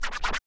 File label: FX scratch